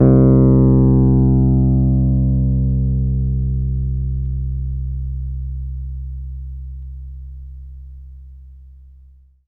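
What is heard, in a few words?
E PNO C1 MF; Synclavier Sampler Library; KEYS AND GUITARS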